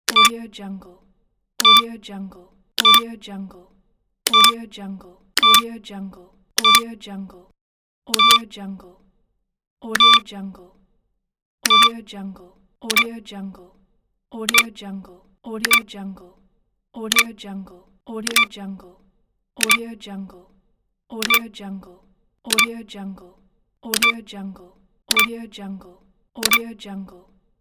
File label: button clicks with beep; threestateaudio